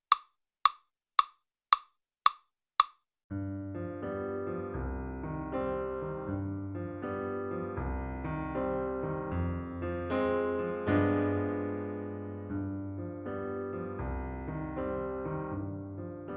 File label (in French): valse grise accompagnement